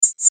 Mouse Over; Mouseover Digital Warble Bright; Multimedia; Blastwave

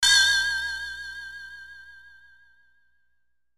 Hit Robotic Warble; Sound Design; Blastwave

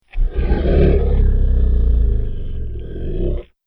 Dinosaur Low Snarl; Dinosaur Sound Effects; Monster Sound Effect

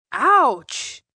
Pain Teen Ouch, Pain, child, boy

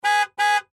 Car Horn Twice; Transportation Automobile; Car Sound Effects; Cars (Sound Effects:Vehicles); Blastwave

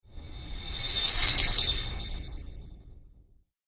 True Chains Of Magic; Magic Sound Effects; Chains Sound Effect - Clink, Clatter, Clang, And Clink Are The Sounds That These Chains Make.; Andrew Fleming

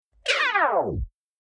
Old Robot Negative No Oww Electronic Pitch Down; Sound Design

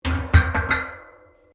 Pipes Metal Impact Tyre

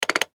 Mechanical Gear Turn; Multimedia; Blastwave

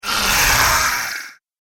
Scream Vampire, Monster Sound Effects; horror

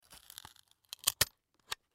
Snips Cut Wood Strap; Scissor; Industry; Blastwave

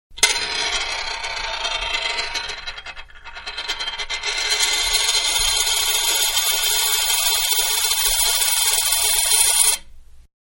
Quarter Spun On Glass, Coin; Money; Objects; Manhattan Production Music

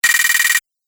Android Rattle, robot

